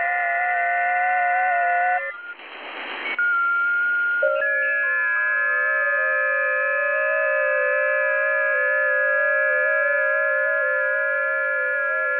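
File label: websdr recording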